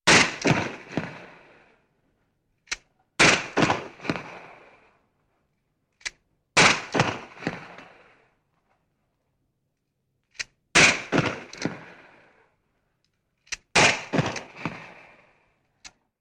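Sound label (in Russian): выстрел из винчестера перезарядка между выстрелами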